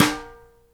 snare GLASS, Live Snares

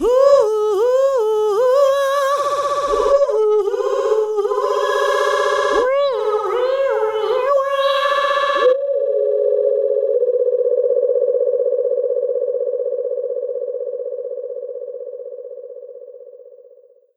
HOUOUHOU, ADLIB WOM, Funky Vocals; USB Soundscan vol.18

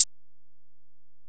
HiHat, Alesis DM-PRO

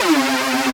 Synth, DnB One Shots

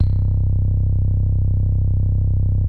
Bass Synths hits and octaves

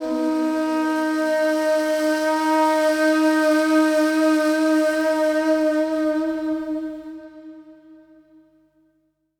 SIERRA FLU; Best Service Advanced Media Trax Building Blocks

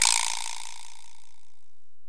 Korg x5, Drums

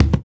African Perc; Spectrasonics; Supreme Beats; African Contemporary; Afro DRMs

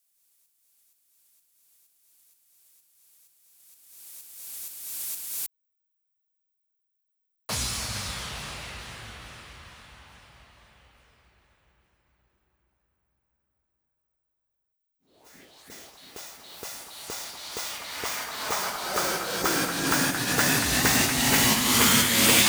Drum Loop FX, 128BPM; VEC5 Drumloops; VEC5 Multi Loops 128BPM; Loop Elements

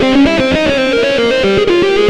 xtra lead

